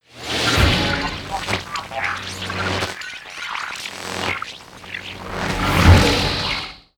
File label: promo pad; music elements